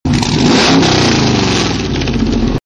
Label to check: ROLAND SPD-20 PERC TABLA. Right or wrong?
Wrong